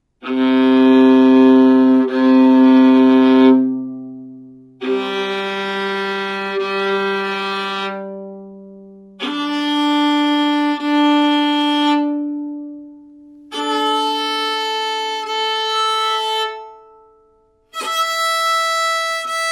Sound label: Fiddle open strings